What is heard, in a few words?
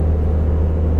R44 Engine Internal, aircraft